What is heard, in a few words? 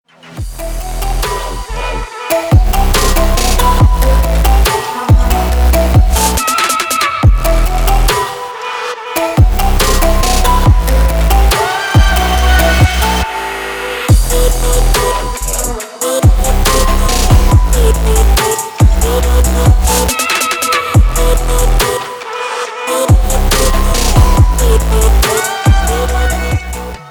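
ringtone for iphone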